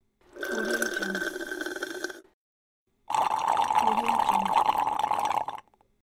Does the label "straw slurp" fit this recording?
Yes